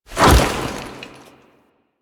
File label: Foley Melee Sweep; Warframe; Kahl